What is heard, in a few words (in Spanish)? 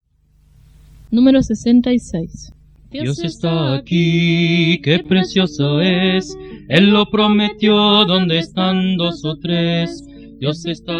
Coro, himnario niños